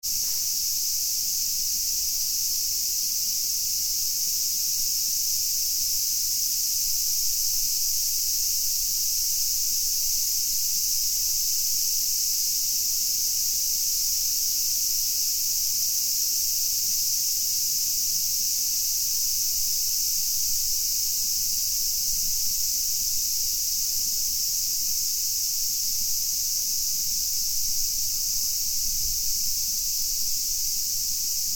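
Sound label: cicada, Fukushima University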